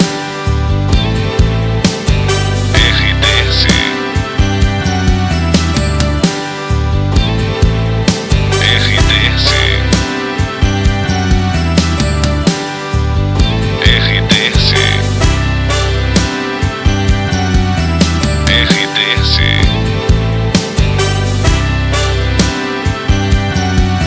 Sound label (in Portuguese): trilha para locução; Oeste